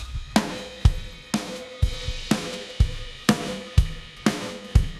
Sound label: only drums